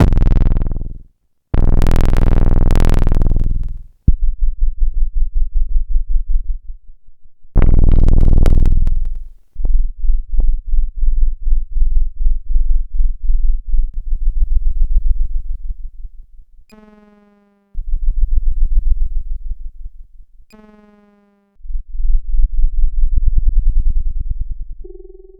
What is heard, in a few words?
808 Subaudio